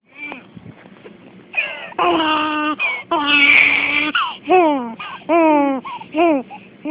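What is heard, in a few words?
baby hungry, female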